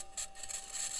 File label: mic, mars2020 edlcam